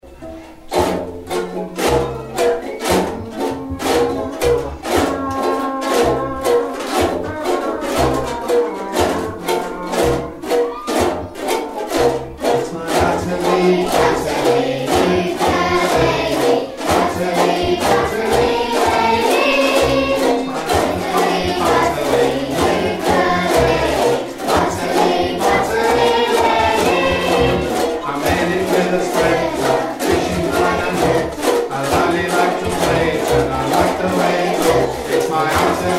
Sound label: The Utterly Butterly Ukulele Song, School; Welden C of E School